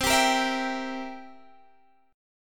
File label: B sharp 7th B sharp; B#7; strummed